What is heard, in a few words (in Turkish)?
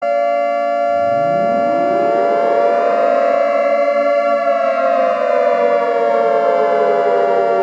nukleer siren sesi